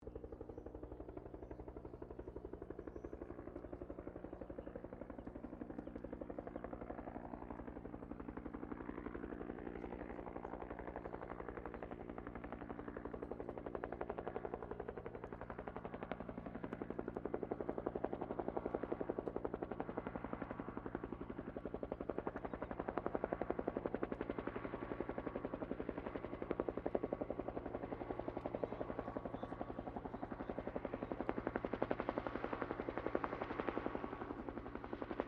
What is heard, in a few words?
HELICOPTER 1967 BELL UH1 IROQUIOS HOVER DISTANT AIRFIELD NOISES; RAN UH-1 Iroguios